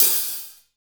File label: HH OP, Drum Dry; East Collexion; Drum 1